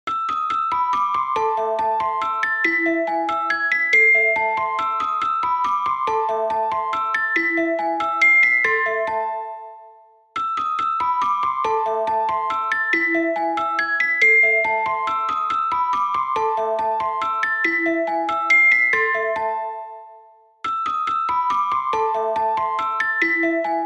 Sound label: ICE Cream Truck; Fur Elise